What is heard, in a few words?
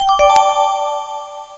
evidence collect